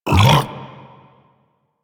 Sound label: Regurgitate Cast; DE; Warframe; Warframes; Grendel